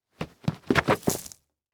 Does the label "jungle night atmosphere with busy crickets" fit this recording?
No